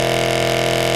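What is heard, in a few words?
engine exhale, advanced engine sounds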